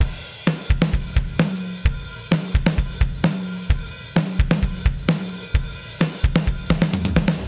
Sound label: drum